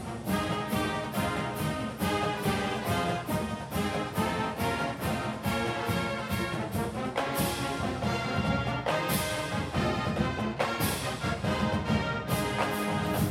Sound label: Fight Song; Os Beaver